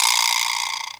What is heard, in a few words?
EQ Perc, Percussion Mix